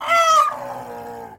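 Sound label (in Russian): короткий крик петуха на ферме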